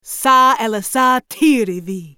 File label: ultimate effort; Karma; Character Voices - English